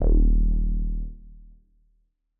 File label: M Elec Bass D#1; KG MegaSaur